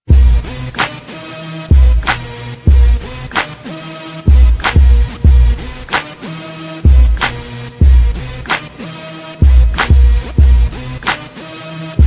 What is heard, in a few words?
gangsta beat; hip hop